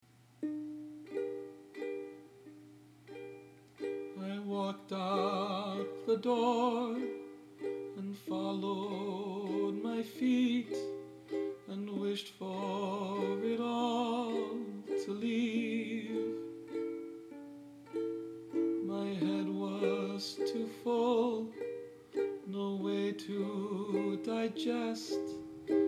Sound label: ukulele